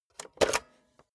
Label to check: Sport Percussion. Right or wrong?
Wrong